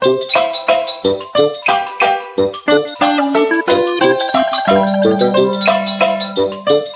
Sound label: Jingle Mail; Taiko no Tatsujin DS Touch de Dokodon